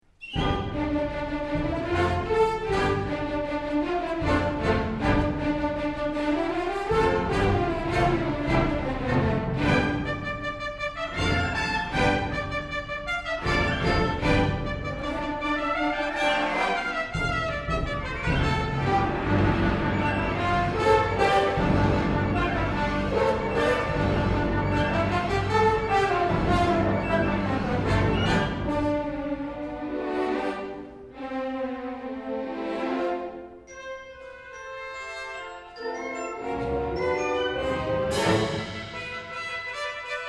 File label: March in Three, Symphony; 10 English Dances